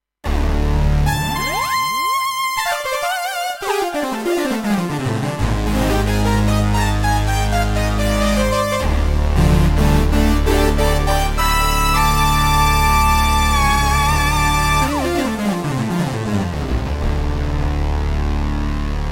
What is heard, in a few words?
Storm Leader